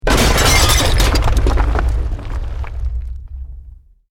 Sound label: EXPLOSION MEDIUM BRICK WOOD GLASS NO FIRE